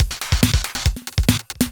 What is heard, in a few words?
Breakbeat 140 BPM